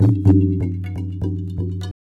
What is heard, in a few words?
Tabla, 125 BPM; Zero-G; Pure Tabla